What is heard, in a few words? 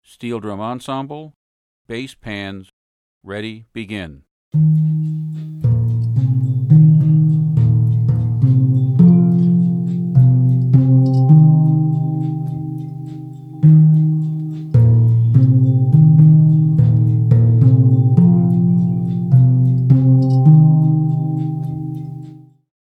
Bass